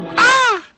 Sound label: ahh